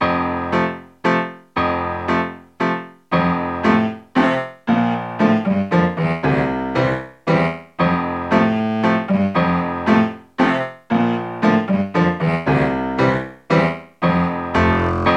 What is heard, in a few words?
Waltz